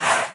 Whisper Death; Peace, Death

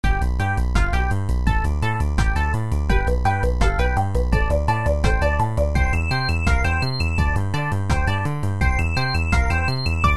level Music; SpongeBob SquarePants Pyramid Peril

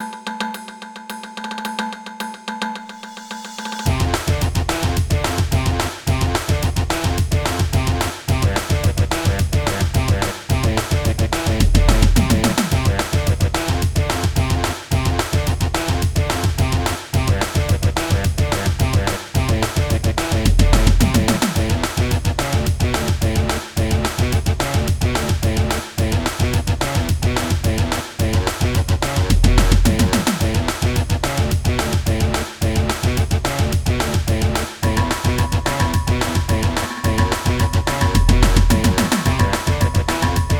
music upbeat; galaxy hero android

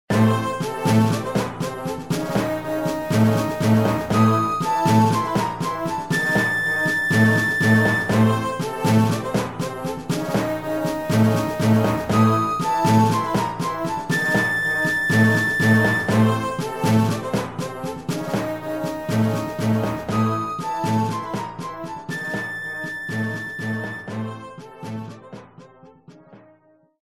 Enemy Missle Launch; Enemy Missle Launch 2 (Katina); Star Fox Command

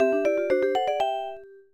Chime; little mermaid the ariel s undersea adventure nintendo ds

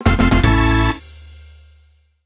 Small Puzzle Completed; Chibi-Robo! Zip Lash

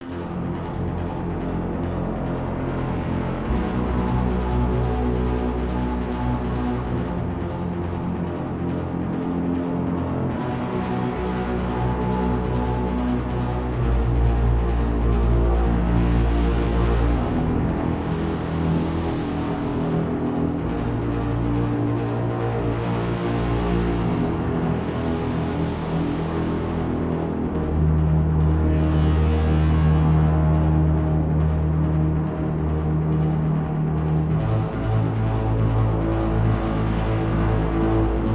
Tension; Mass Effect 2; Heretic Station

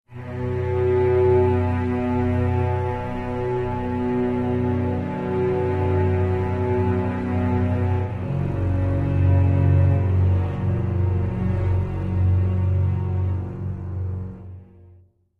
Suspicion; the walking dead season one gamerip 2012

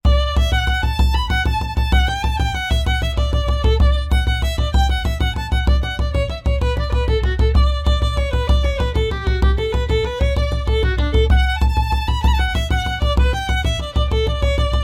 Tradition irish; Adventure Xpress

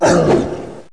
attack zombie

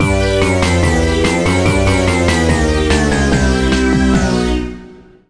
Jingle frog die

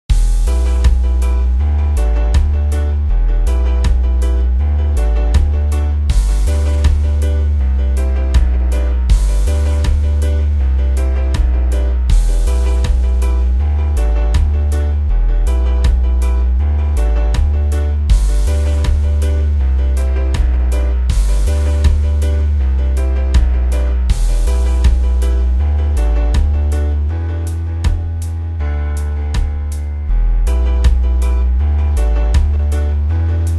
reggae; horace ps4 switch windows xbox one gamerip 2019

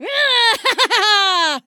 voice female battle shout; Infernal Radiation

